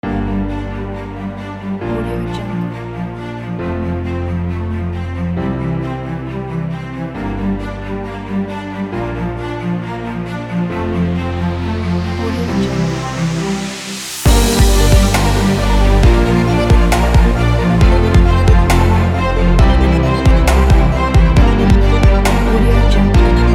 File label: digital epic technology